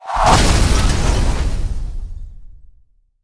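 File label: rapid fall from a height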